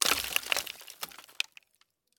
sand falling on scrapwood exterior perspective debris rubble; eneas mentzel; sonniss gdc